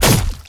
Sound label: Bullet Hit Enemy